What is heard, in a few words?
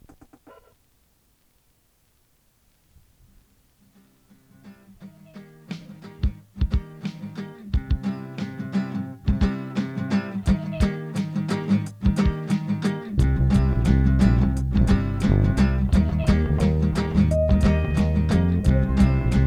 wack beats